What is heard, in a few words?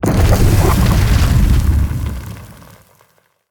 Seismic Blast Staircase; Evolve; Behemoth